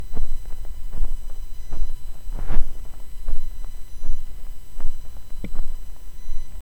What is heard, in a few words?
whoosh